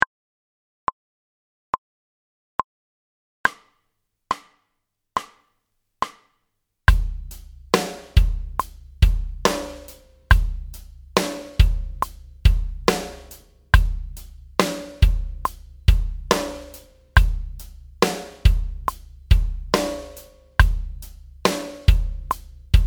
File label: Backing Track